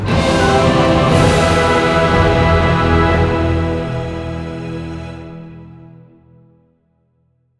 stinger loot box; heroes of the storm full gamerip 2015

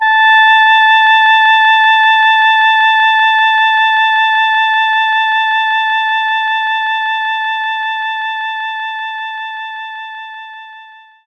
Oboe A4

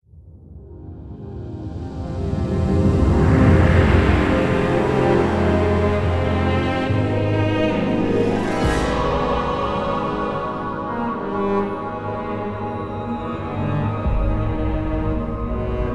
Stinger Lose

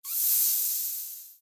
Steam; Candy Crush Soda

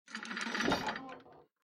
boat trigger

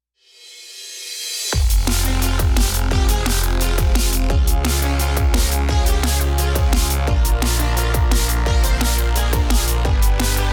Heavy Dnb; Cyber Hook

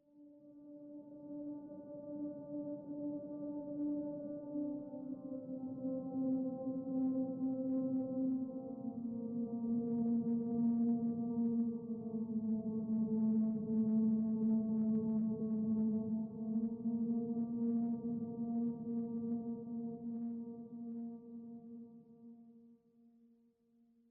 Creepy Strings Dark Pads

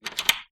lock; Lessons in Love

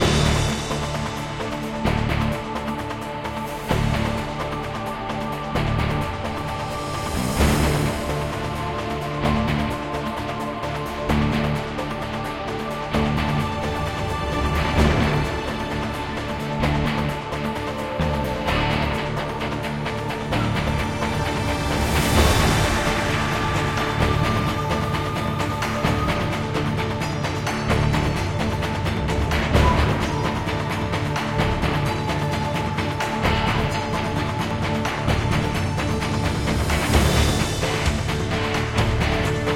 music fight; naruto ultimate storm android ios gamerip 2016; jinsemingren music level1 fight

